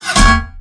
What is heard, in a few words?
tank hit small interior